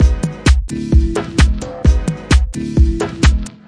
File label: 130 tekhouse groover; SAMPLED MUSIC LOOPS